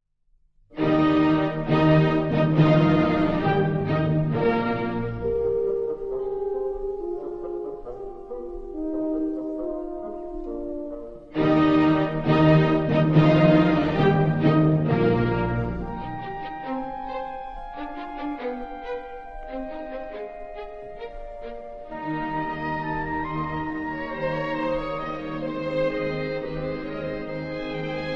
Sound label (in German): Klavierkonzert Es Dur Allegro